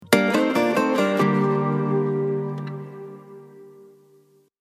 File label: Sonic Logo; Mastercard